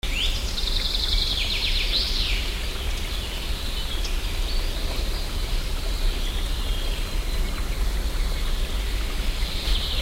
quiet stream and birds; germany deutschland

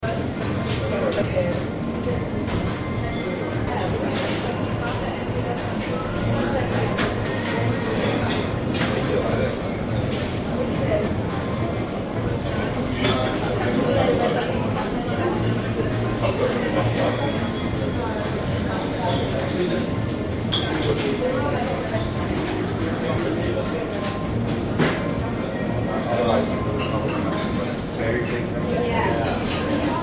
daily coffee crowd; germany potsdam

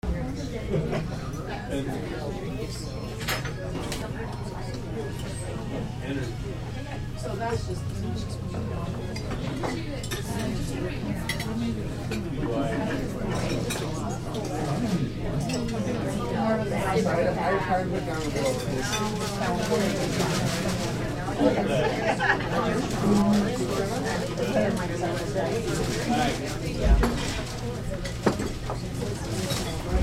atmo restaurant crowd; morro bay; dorns

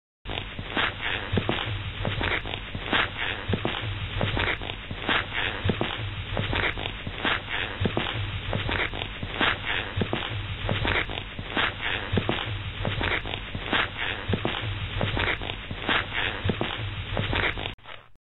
footsteps unisex squeaky shoes

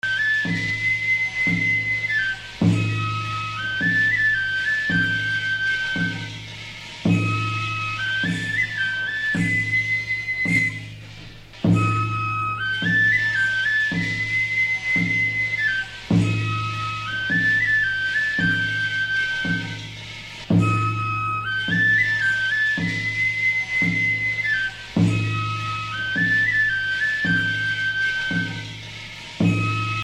atmo asia shrine flute and drum

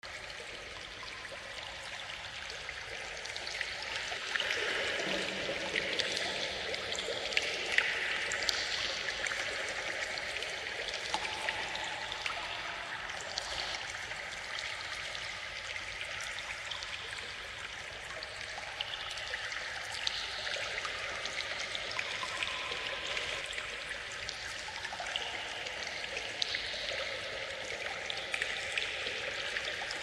underworld pool drips with heavy echo

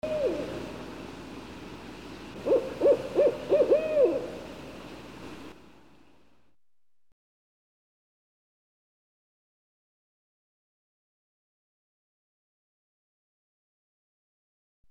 atmo birds barn owl

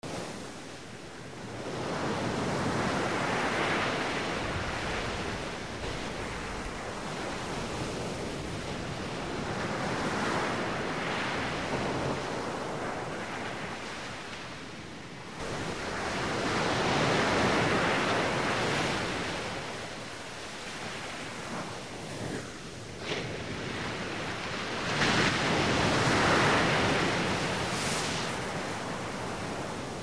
atmo waves rolling in

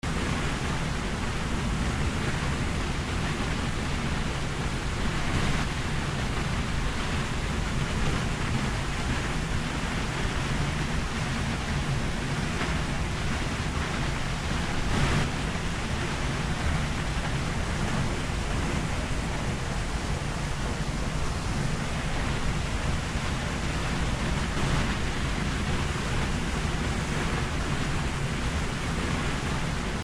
waterfall large churning

